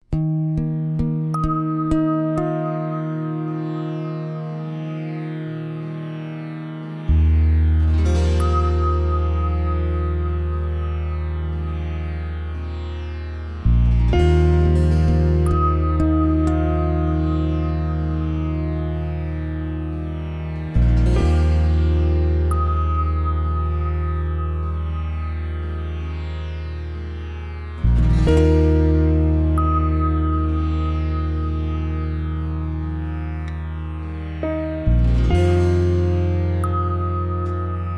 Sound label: Instrumental; Khuda Kay Liye